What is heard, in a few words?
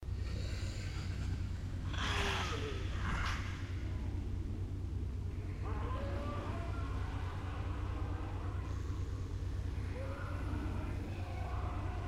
AMB Lonely Manor Wastelands